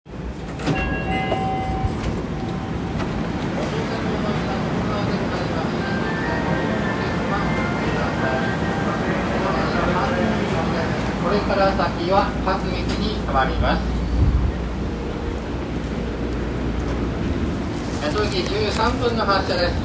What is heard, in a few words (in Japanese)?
池袋線所沢東, train sounds; 西武